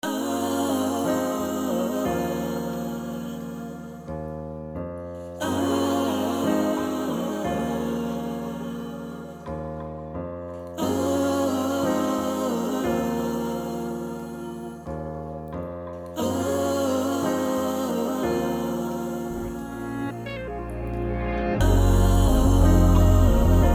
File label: RNB; XOXO; hip hop trap and rnb bundle by raven